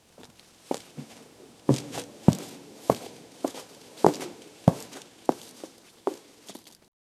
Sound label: dramatic whoosh of fabric, armor